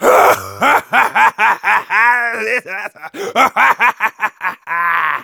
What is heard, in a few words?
laugh long, de; Demoman